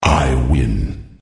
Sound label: win